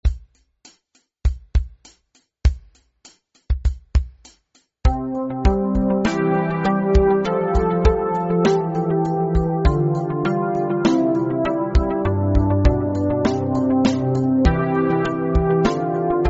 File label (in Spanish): campanita base rockera